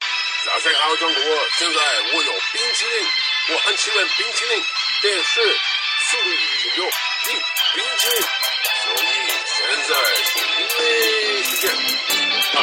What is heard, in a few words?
drill